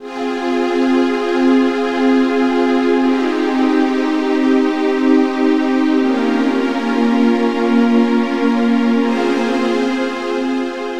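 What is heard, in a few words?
strings, Drum & Bass; Best Service ProSamples vol.03 - Drum & Bass 1; AKAI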